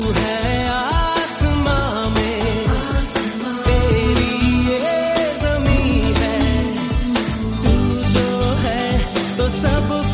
hindi song tone ringtone; bollywood ringtones

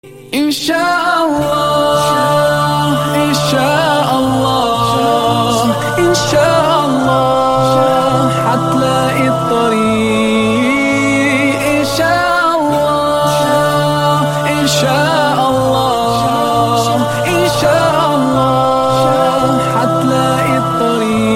islamic ringtone; islamic ringtones

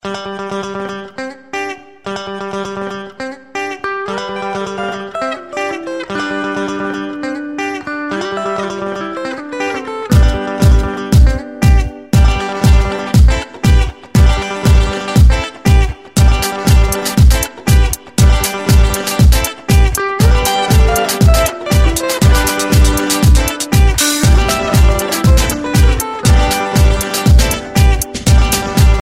spin guitar ringtone

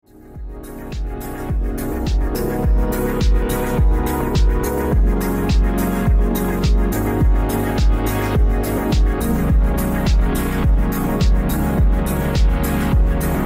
electronic gem ringtone; iphone

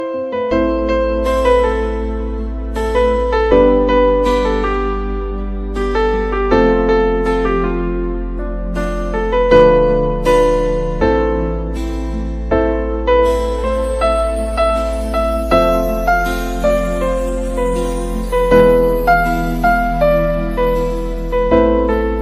bollywood music ringtone

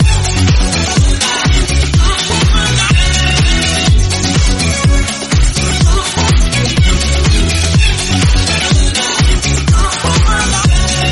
french electro ringtone

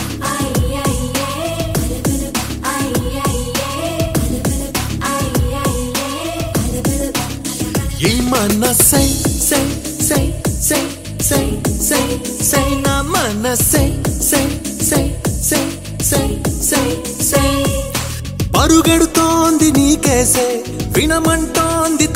telugu ringtone

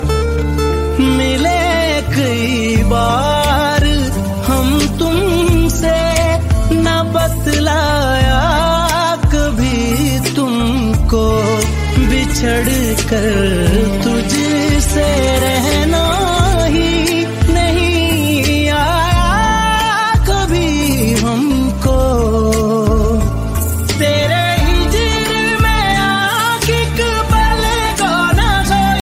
pakistani drama ringtone; berukhi; ary

